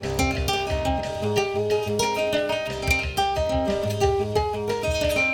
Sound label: 90 Bpm Nylon